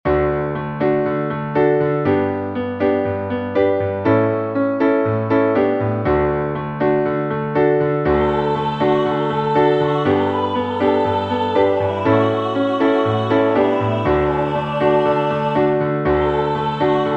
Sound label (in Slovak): klavir hlasy